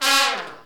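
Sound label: Brass Trumpet FX; Tpts; Roland L-CDX-03 Disk 2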